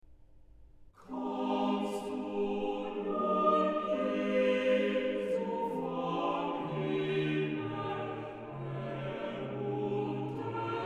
Chorale, ORGAN; BWV0057; Kommst du nun, Jesu, vom Himmel herunter; BACH; BACH ORGAN WORKS; CHORALE PRELUDES

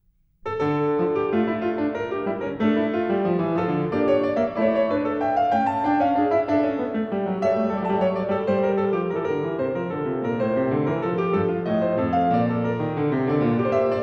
Courante, KLAVIER; French Suite #1 In D Minor; BWV 812; BACH

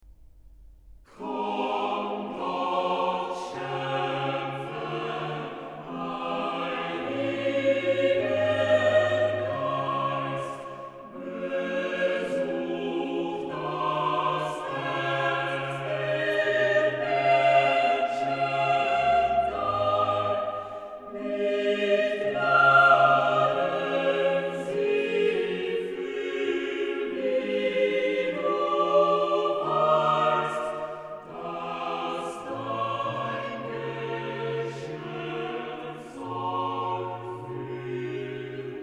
Chorale, organ; BWV0370; Komm, Gott Schцpfer, heiliger Geist; BACH; BACH ORGAN WORKS; CHORALE PRELUDES

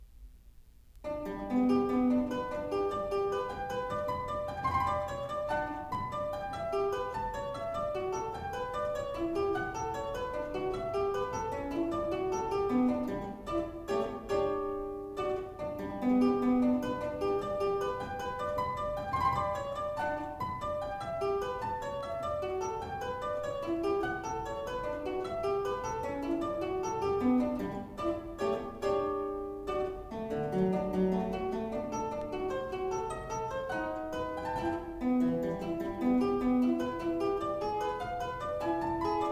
G major, Tempo di Menuetto; Partita No.5; BWV 829; BACH; CLAVIER WORKS; PARTITAS

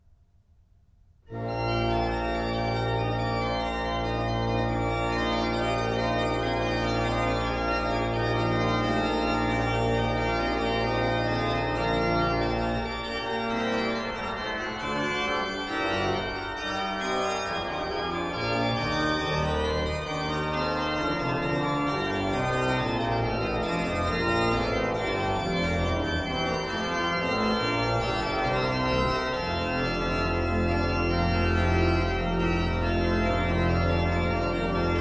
Prelude in B flat major, ORGAN; BWV545b

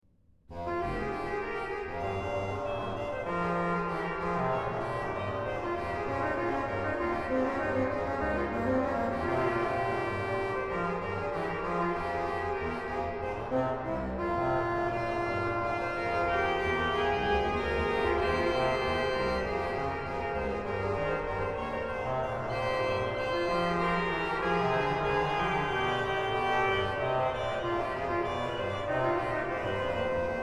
Orgel; BWV0646; Wo soll ich fliehen hin; BACH; CHORALE PRELUDES

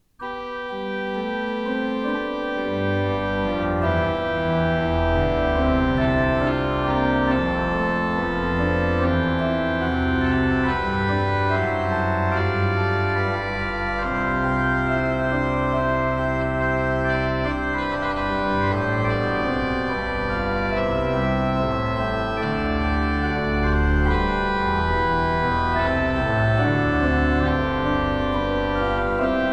organ, choral preludes; Gelobet seist du, Jesu Christ; Bach; Bach Organ Works

